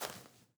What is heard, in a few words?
footsteps GRASS; PC Building Simulator